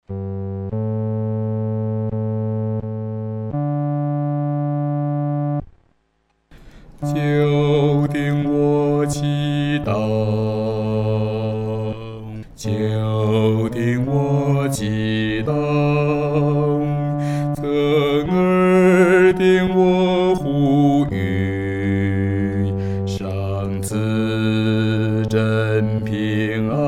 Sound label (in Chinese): 独唱 第四声; 垂听我祷告